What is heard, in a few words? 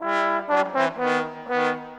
Trombones, Orchestral; Sonic Foundry (Sony Creative Software); Orchestral VOL-2; Modern 35